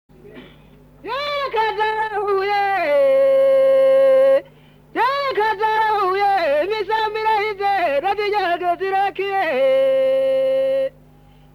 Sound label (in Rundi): kwishongora, burundi